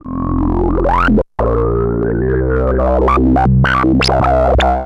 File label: VOCODIZER; SFX; Syntec; Wall of Sounds; TECHNO-BOX